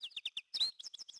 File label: goldfinch; World of Tanks Blitz; hangar blitz